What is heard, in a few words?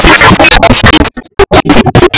controlled explosion